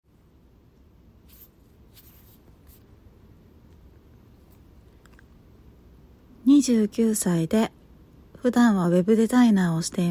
実演; オナニー実演

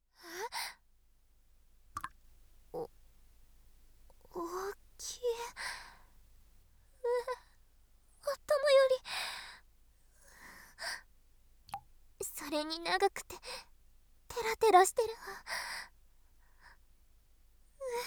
フェラチオ＆イラマチオ 効果音入り; 熟女制服フェラチオ

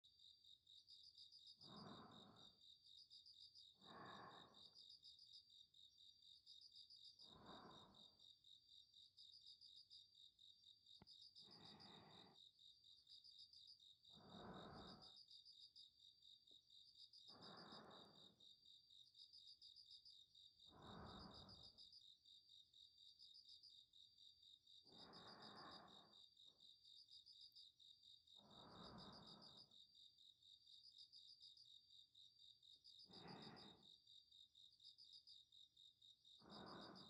寝息ループ, ASMR; 母性たっぷりの化け狐に拾われたので孕ませようと思う

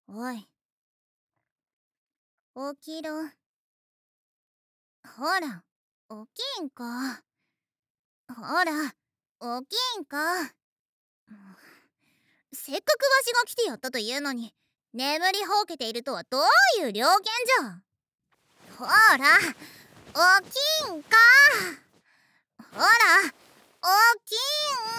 フェラチオ 狐少女; 狐少女の恩返し

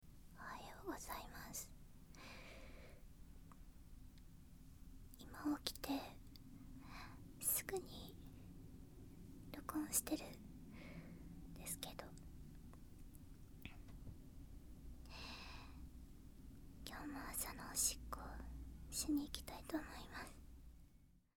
朝起きておしっこへ; 寝起きおしっこ11日分

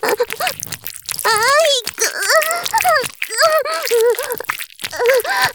絶頂 潮吹き, ASMR; 騎乗位

